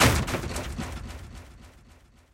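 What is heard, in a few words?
ricochet hit modules, tanks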